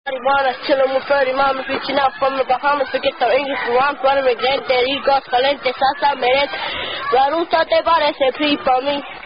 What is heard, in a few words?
Rap; janice ackerley collection